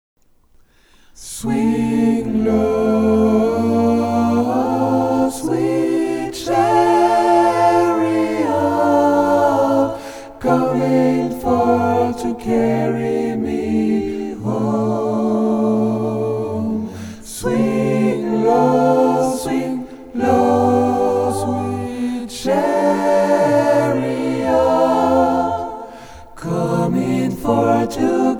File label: intro ten, Gospel; swing low